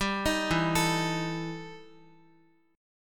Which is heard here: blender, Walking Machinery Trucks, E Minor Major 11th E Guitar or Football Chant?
E Minor Major 11th E Guitar